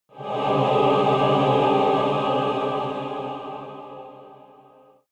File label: evil choir chord medium